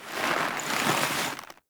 Foley Hands To Back; Parvos Lich Intro; Cinematics

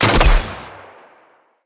Bow Shot Heavy; Sinecure

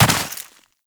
Land Snow, Footsteps; Warframe; Tenno

